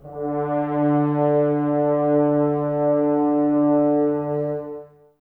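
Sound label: Brass French Horns, Woodwind Brass Ensemble; Sample CDs; Miroslav Vitous; F.Horns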